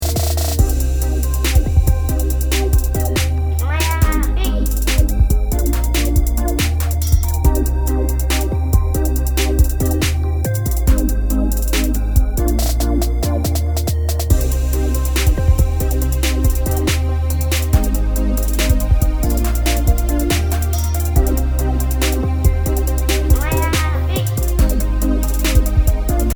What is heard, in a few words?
rap track